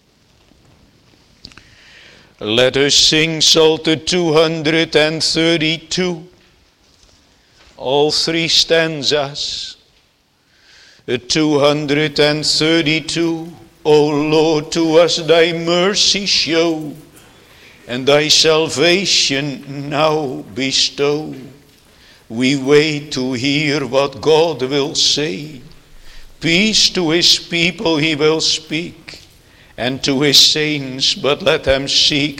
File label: Singing; luke 24:35